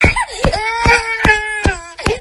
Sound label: screaming baby short